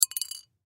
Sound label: Popcorn Kernel Drop Bowl; Food Sound Effects; Household; Foley